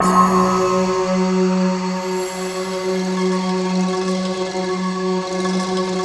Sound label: bamboo, Industrial; Optical Media International; Sonic Images Library